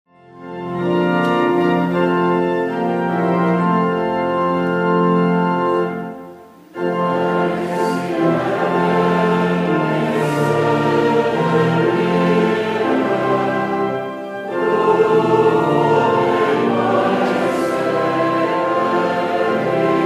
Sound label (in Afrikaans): Gemeentesang; Skrifberyming 9-1 vers 1, 10, 11